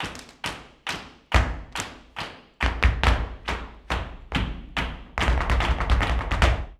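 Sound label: percussion, FARRUCA TAP, Flamenco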